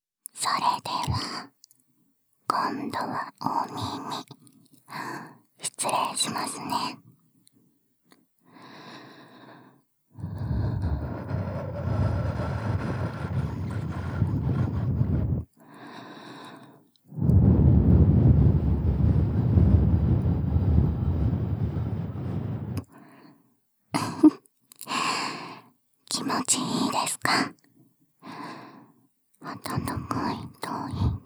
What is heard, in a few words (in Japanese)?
耳舐め, ASMR; ユイナちゃんの耳舐め